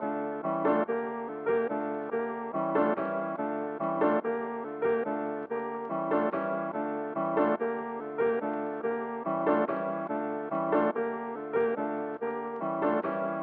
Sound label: hard piano melody